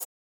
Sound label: Catch Blade; Boomerang Fu